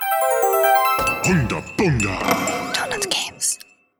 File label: Loading; Cave Bowling; Android